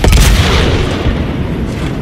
fire

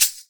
shaker

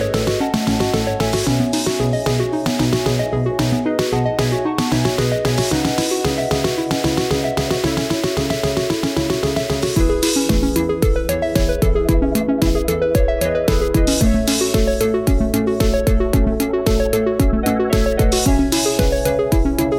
electro

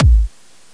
kick

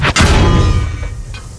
beat

